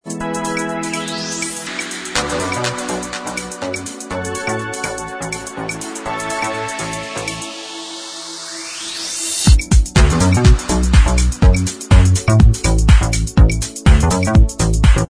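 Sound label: Instrumental Track, backing tracks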